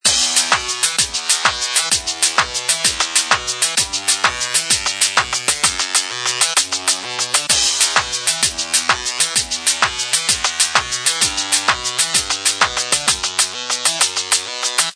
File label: Dance, Ringtone; HTC Freestyle